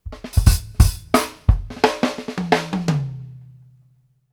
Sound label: SWING HAT5 R, Drumworks FILLS 84; Sampleheads; New York City Drumworks VOL-1; KD FILLS 84